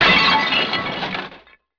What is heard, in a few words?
fall; BESTSHOT; noisybit